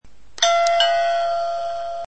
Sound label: Bell ding dong